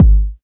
fluffy kickdrum sample g sharp key, bass drum single shot tuned to the G# note